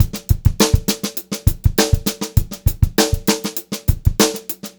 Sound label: BEAT, Funk-Groove Drumloops; USB Soundscan vol.38; AKAI